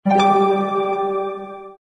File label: harp soft ascending pluck